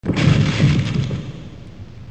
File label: Thud